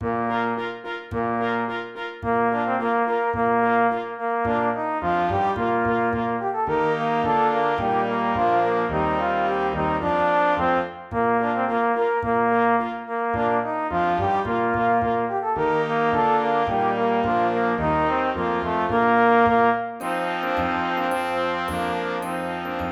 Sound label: Tambourin Tbn Sound clip